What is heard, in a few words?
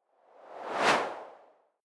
whoosh simple high